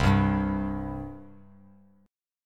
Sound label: C 5th C down Bass Standard; C5 strummed